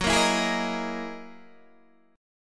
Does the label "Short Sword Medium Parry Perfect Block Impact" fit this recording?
No